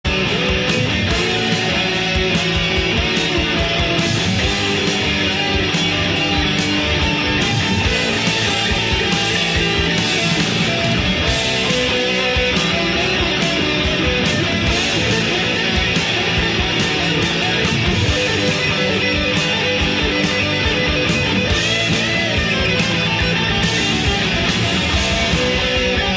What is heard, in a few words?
Solo